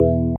organ, keys

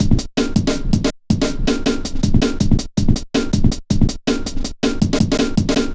metallic stepz, drum loops

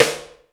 ROOM S, ROOM KITS; Crypton - DrumBase5000

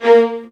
VIOLINS, Synclavier Strings; ILIO

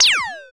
FX Analog; Sound & Vision - Gigapack II

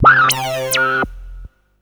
FX, Drum & Bass

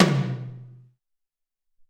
SR16 Tom, Alesis SR-18

